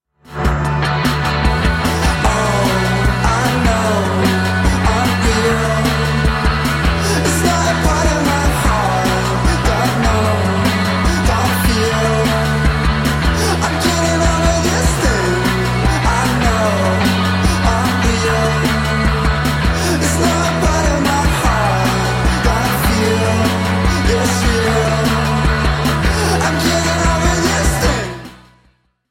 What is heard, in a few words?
cymbals erosion